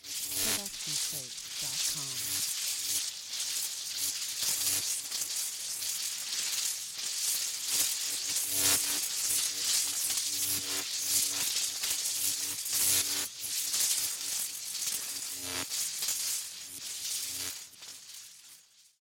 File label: lighting